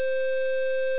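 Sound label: square wave; analog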